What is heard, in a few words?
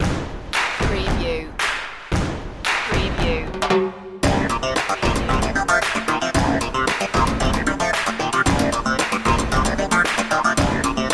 rhytmic soul